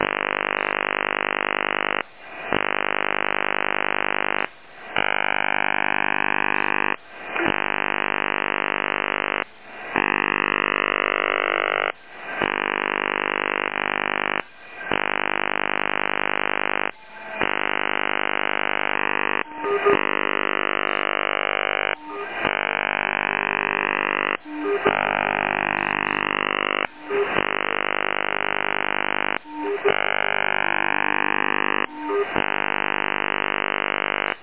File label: jammed